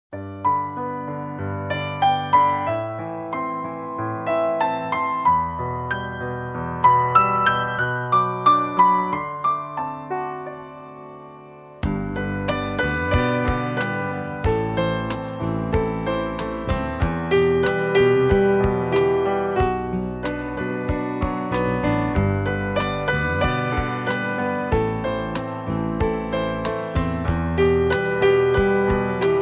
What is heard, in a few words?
Slow (Piano Solo)